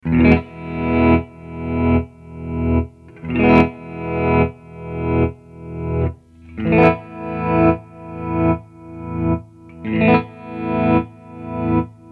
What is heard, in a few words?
square